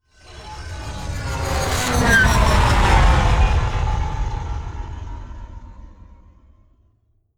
spaceship fly by

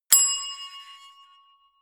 notification bell

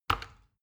plastic button tiny click heavy fast

